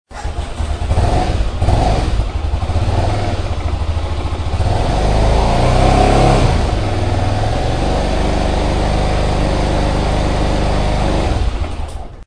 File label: stock f650st, exhaust